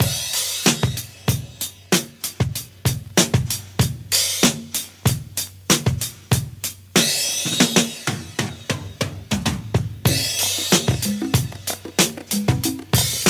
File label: 95 bpm hq drum groove B key, Free drum beat - kick tuned to the B note. Loudest frequency: 4086Hz; drum loops